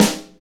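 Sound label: SNR snare, Motown; Northstar; Drumscapes Roland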